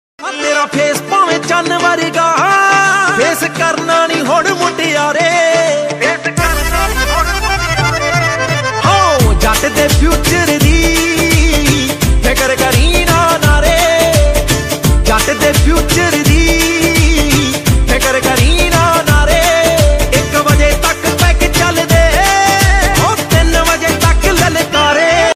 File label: DJ Punjabi Ringtone